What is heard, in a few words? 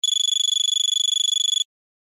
Ring Digital; Google; Android Open Source Project